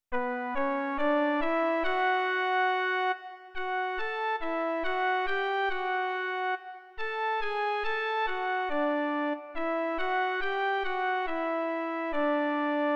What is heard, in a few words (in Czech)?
jen melodie; Doprovody Farského liturgie